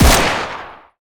fire, uzi; weapons; Urban-Coalition; Decay; arccw ud

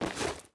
mag pouch, weapons; Benny Placeholders

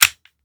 slide lock, glock; weapons; Urban-Coalition; Decay; arccw ud

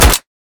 fire auto, weapons uzi; Urban-Coalition; Decay; arccw ud

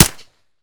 fire sup, glock; Urban-Coalition; Decay; arccw ud

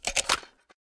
mag out, g36; ARC9 uplp fesiug

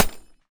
mech, m249; Urban-Coalition; Anarchy; arccw ue

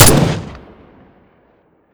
fire, weapons mini14; Urban-Coalition; Decay; arccw ud

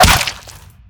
impact, violence; Benny Placeholders

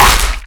melee skull break, violence; Benny Placeholders

